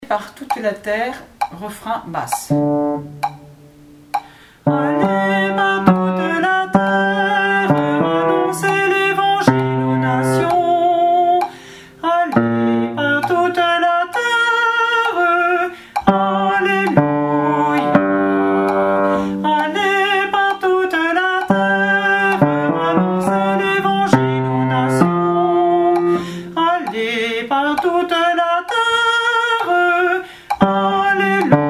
REFRAIN BASSE; Allez par toute la terre

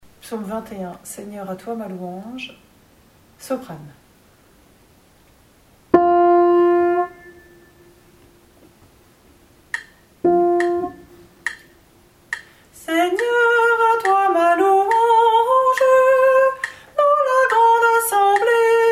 Psaume 21 Seigneur à toi ma louange SOPRANE

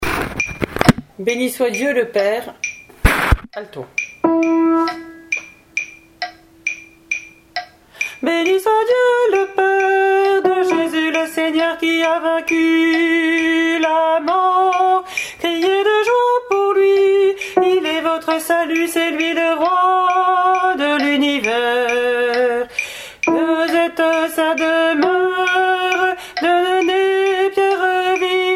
ALTO; Béni soit Dieu le Père

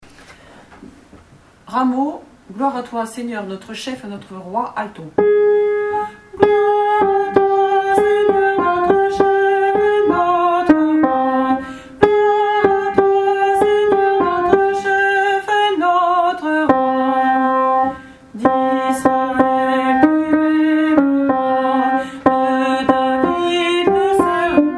Gloire à toi notre chef et notre Roi Alto, chorale paroissiale; semaine sainte

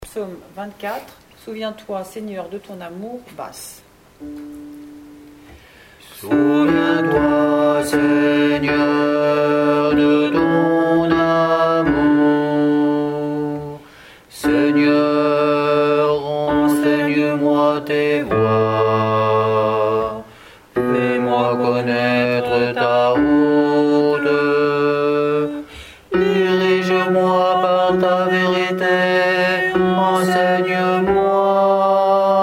Souviens toi Seigneur de Ton amour Psaume Basse; Fonsalas

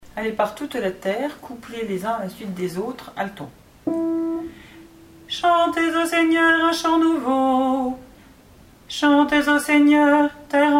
COUPLETS ALTO; Allez par toute la terre

